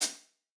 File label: japanese pop hihat